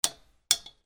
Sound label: CLICKS